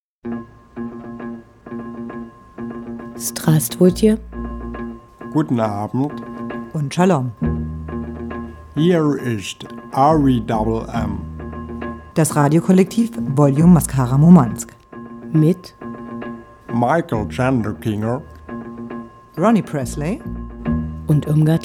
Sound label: jingle